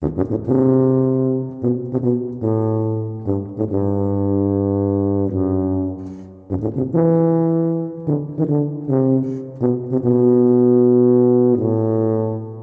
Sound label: Tuba